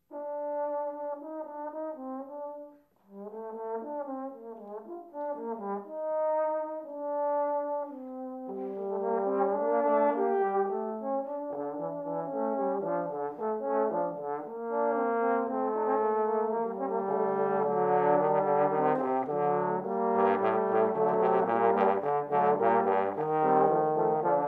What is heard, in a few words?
Trombone Quartet Bach Fugue; JMU Trombone Quartet